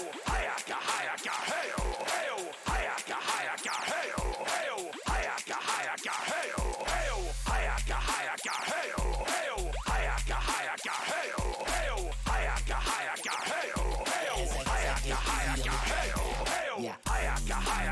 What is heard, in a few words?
Dubstep Mashup; SVT Rorelsen